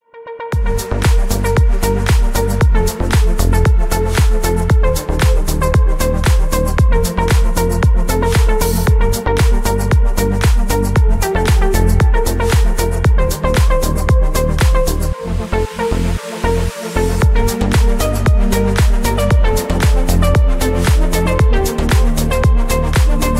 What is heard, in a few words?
instrumental